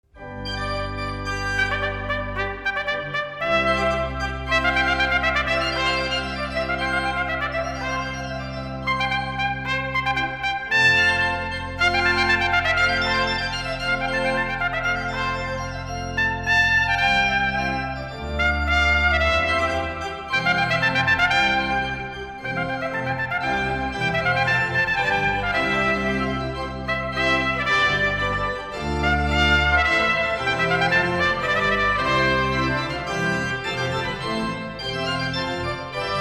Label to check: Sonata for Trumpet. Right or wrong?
Right